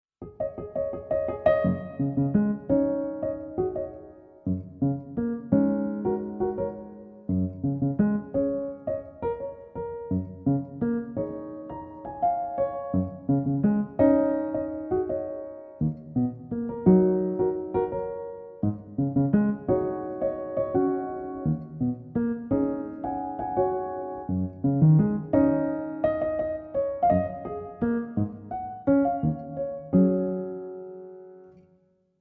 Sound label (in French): Quatuor; Blanc